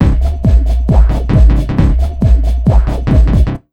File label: Big Beat Drumloops, LOW 135; AKAI